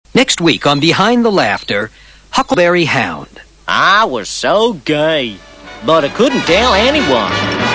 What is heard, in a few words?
laughter